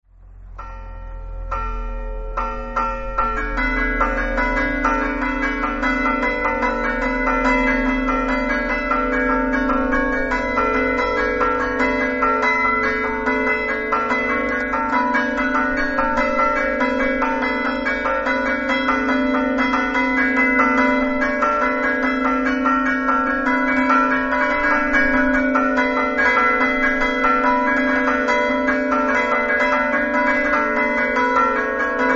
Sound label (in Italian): campane; muris